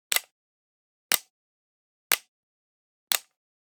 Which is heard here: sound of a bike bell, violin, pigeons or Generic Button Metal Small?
Generic Button Metal Small